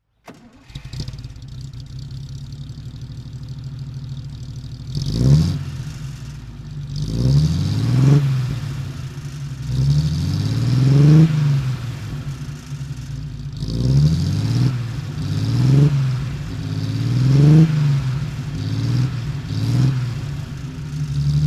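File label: wolseley hornet special throttle, engine sound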